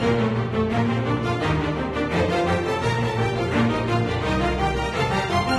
End Sequence Loop; AlphaBetty Saga

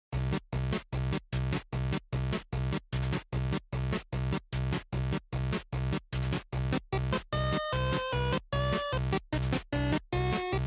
Instrumental; Friday Night Funkin' Skeleton Bros

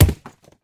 Rock Impact Big

